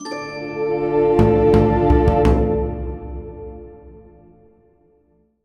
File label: card spell; Armello The Bandit Clan